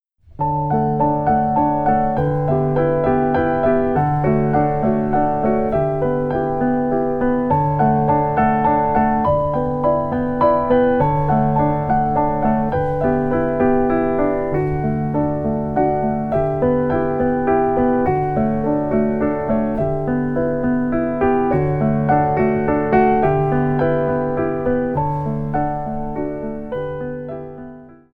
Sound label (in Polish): kropelka podklad, wersja instrumentalna